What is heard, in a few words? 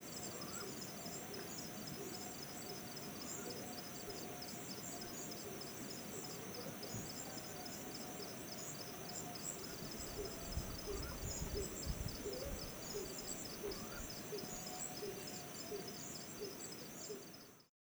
Omani Owl Faint Begging Of Juvenile Soliciting Calls Of Female At 600 M Distance